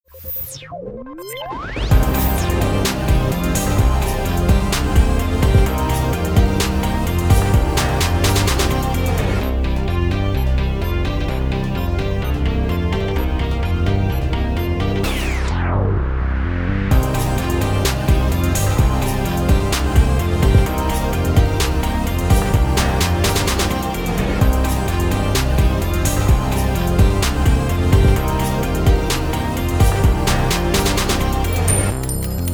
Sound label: zap beat